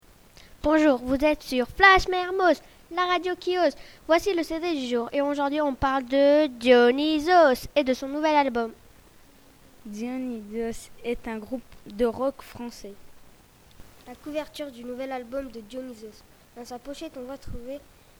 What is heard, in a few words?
bird